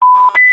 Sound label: warning signal; Navitel